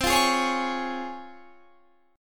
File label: Db Major C; Db/C; strummed